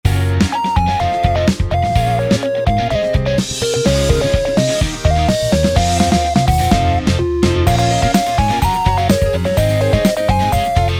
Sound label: Drums Kime